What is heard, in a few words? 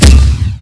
barrel death